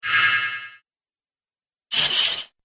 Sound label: Magic Spells; Magic Sound Effects